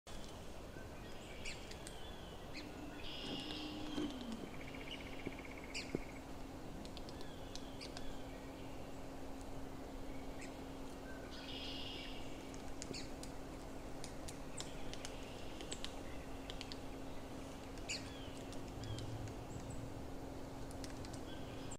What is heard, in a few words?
Red cockaded Woodpecker